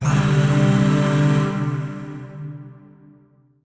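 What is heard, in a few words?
Void Shawzin Pentatonic Major Chord; Warframe; Zariman; Chords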